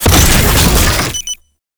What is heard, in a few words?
tank drone deploy; Enemies; Abilities; Warframe; Lasrian Tank